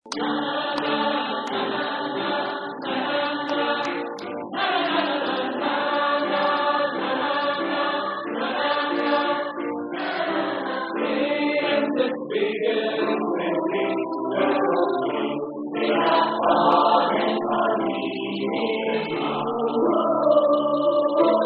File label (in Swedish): bas